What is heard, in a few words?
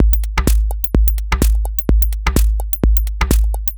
Sardine G# 127bpm, House Drumloops; 90 s Sample CDs; Zero-G House Fabrik Drumloops; ZG Drumloops